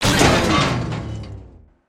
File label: robot death; avant gardens